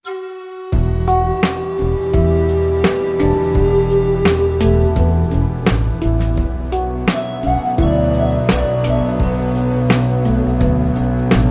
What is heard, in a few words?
Fleet Flute